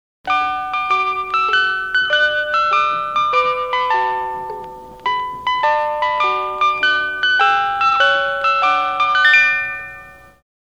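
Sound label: railway chime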